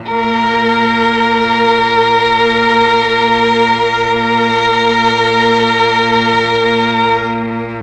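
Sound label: MELLOTRON strings Bb2; Big Fish Audio - First Call Vintage Keyboards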